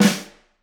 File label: Flam, drum